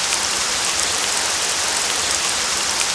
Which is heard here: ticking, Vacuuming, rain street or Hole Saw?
rain street